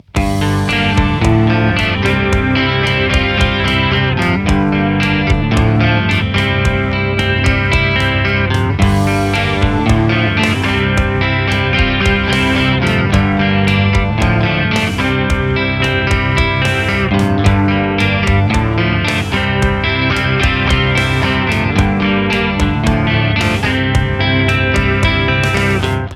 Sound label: post grunge